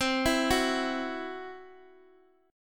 C Major Flat 5th, C, down, chord

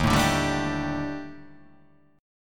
D sharp Major 7th Flat 5th, down, chord

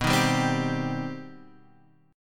C Minor Major 7th B

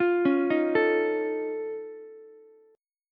F Augmented 7th F Ukulele, chord; F+7 arpeggiated; JGuitar

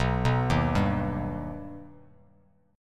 B Augmented B Bass Standard, chord; arpeggiated; JGuitar

